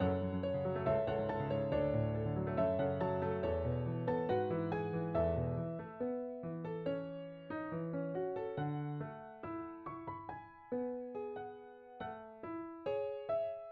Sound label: diminuendo